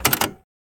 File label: unlocking